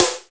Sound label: snare white; Roland L-CD701; Snares 3; Sn Modules 3